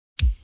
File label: kick punch, kick drum; 80s